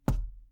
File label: Step